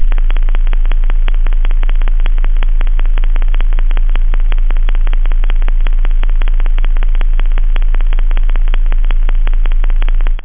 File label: sub base, bass, synth; da capo vol1